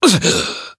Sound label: Voice damaged; zeviro